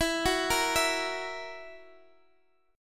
E Suspended 2nd Flat 5th E down, chord; arpeggiated; JGuitar

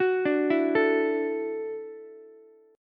Gb Minor 7th Sharp 5th, Ukulele; arpeggiated; JGuitar

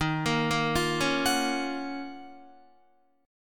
D sharp Minor 9th, Guitar; D#m9 arpeggiated

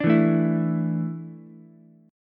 A Suspended 4th A down Ukulele; Asus4 strummed; JGuitar

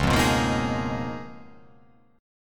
Db 13th, chord; strummed; JGuitar

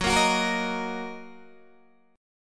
Fsharp 6th down Guitar Open D

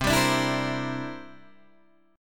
C 9th C down Guitar Open D

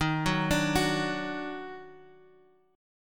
Eb Minor Major 7th, down, Guitar, Half Step Down; chord

